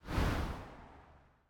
slide; Smite; god Medusa v2

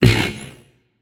notice; smite; achilles

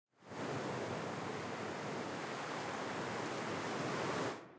Victory Wind; Smite; Susano

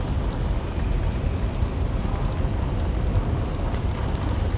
pwr buff lp; smite; gilgamesh

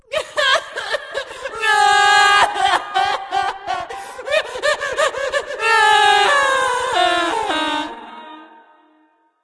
boo hoo; bastet

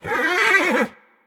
mvt lobby defeat pet vocal; smite; lancelot

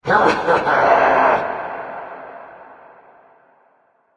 halloween laugh short; smite; thanatos

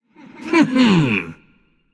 hehheh; smite; hunbatz

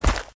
footsteps; smite; god merlin

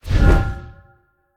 atlas swing; smite; god atlas

